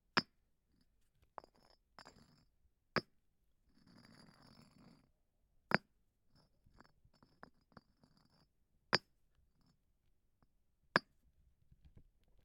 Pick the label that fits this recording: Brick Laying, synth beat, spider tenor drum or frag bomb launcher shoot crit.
Brick Laying